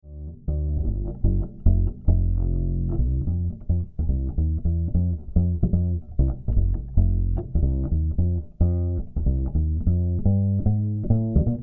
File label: Bass Line Comp On